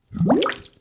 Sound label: Bubble